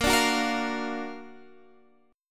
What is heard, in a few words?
Gb Augmented Major 7th Bb